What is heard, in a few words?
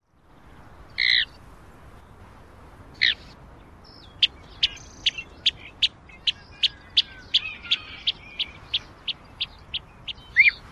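Mountain Plover, more calls combined in sequence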